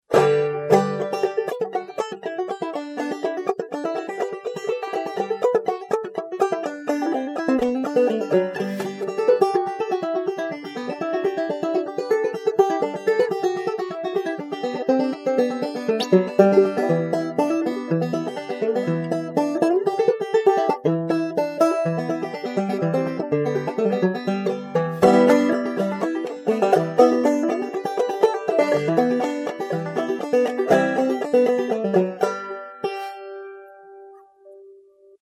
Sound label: blackberry claw 3-finger